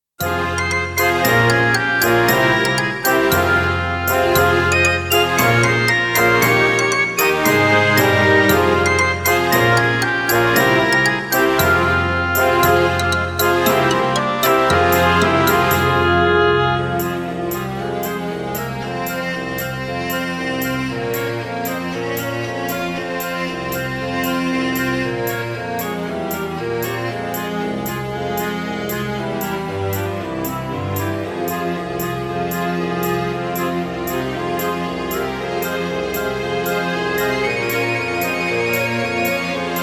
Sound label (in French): La moisson Instrumental